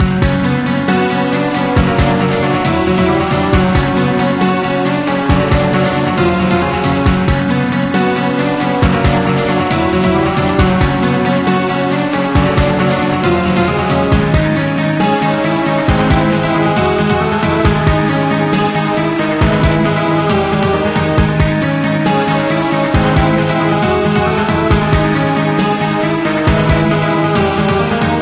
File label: Serious Scene; luna monsters tower defense the deprived magical kingdom